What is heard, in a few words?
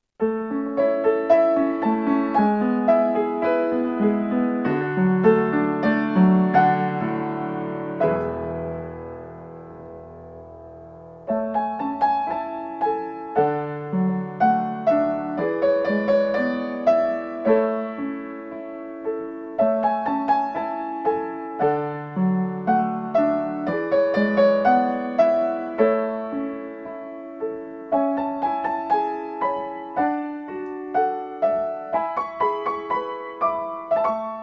Falling Leaves